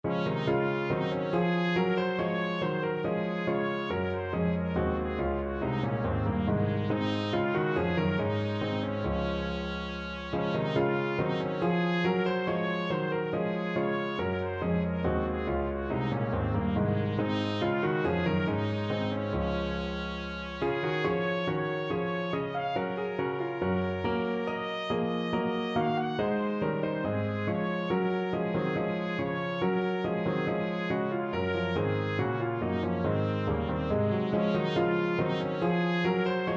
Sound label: wir gehn nun wo TPT, trumpet